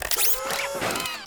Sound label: Menu Scifi Interface; Menu FX